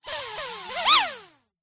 vehicles drone micro motor very short whir burst

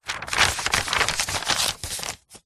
foley paper sheet a4 fold in half; zapsplat

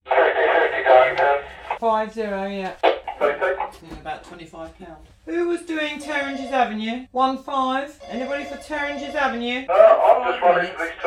ambience taxi office short; sound effects one

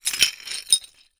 glass pieces broken small thick movement ground; zapsplat

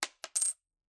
Coin Drop Concrete Floor

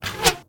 medieval scythian recurve arrow heavy pass by; still north media

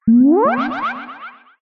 synth space weird synthy sci fi rise accent; Jessey Drake

